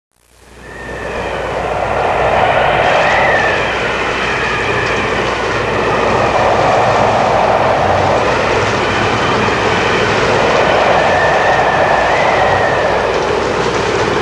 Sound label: Howling Wind